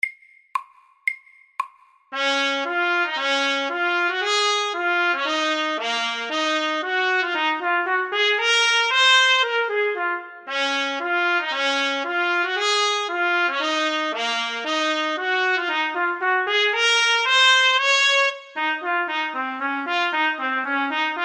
Etyde i Ess dur FORT; Clarke

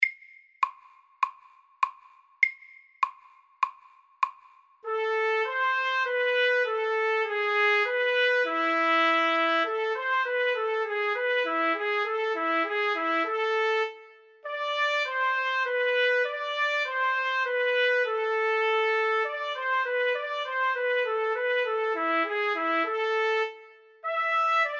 Etyde i H moll SAKTE

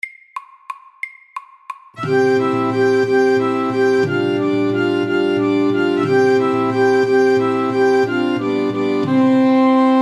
Irsk melodi FAST 180

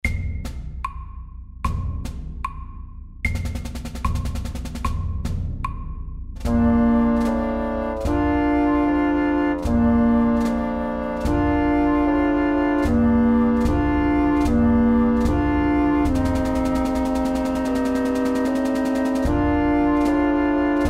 Skolemarsj SLOW 75

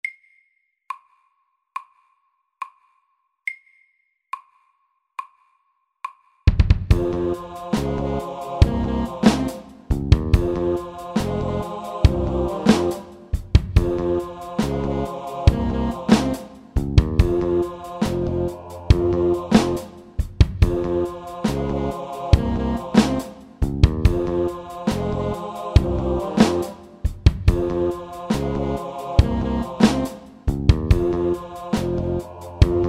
Octave rap MIDDELS

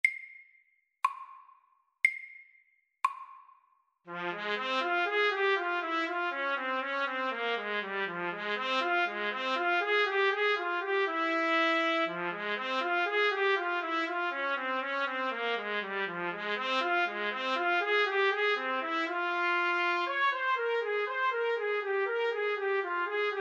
G moll etyde SAKTE

